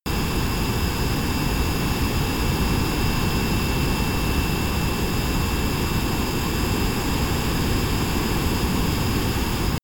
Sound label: military jet f-22 raptor turbine full power direct